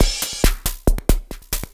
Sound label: percussion loops, Techno; PS-45 WAV Techno ID